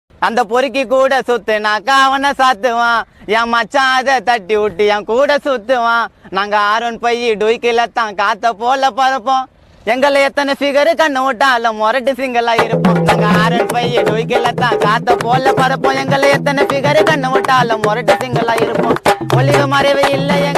rowdy friendship gana song

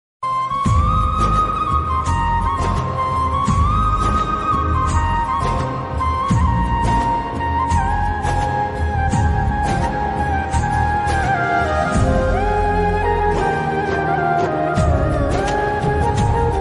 flute ringtone romantic ringtone

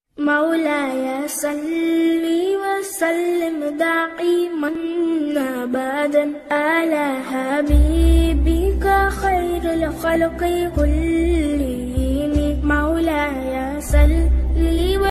maula ya salli va sallim islamic ringtone

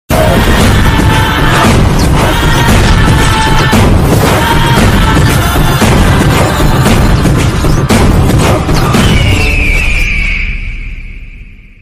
Glimpse BGM Ringtone; kanguva; suriya 42

